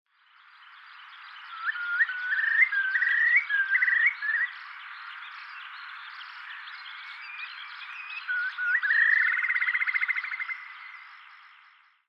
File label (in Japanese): chushaku, tatsuno, koe